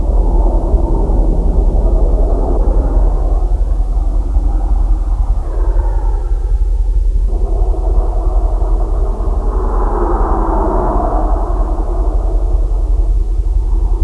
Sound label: engine; svencoop